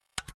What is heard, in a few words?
Wheel; Panda Majesty2